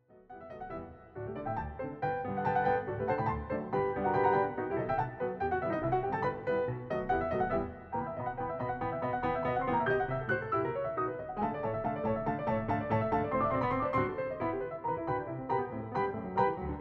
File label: Hungarian Dance in E Minor Vivace Piu presto, piano; brahms; 21 hungarian dances; WoO 1